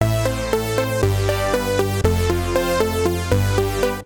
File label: SynBrass (Am) 118bpm; Music Loops; Kershaw; Ableton; Singularities